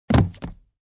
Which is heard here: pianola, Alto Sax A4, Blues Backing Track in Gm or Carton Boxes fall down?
Carton Boxes fall down